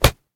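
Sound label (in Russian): слабый детский удар маленьким кулачком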